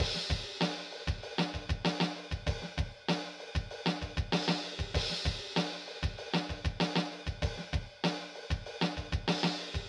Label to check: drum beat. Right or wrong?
Right